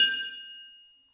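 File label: triangle half open; Percussion; Sonatina Symphonic Orchestra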